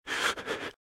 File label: Breathe - Fast Heavy; RadwinIsland